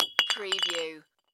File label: Light Bulb Drop; Sound Effects Bible